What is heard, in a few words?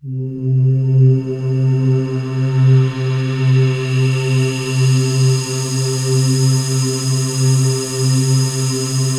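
PAD, atmospheric pads; Best Service; XX Large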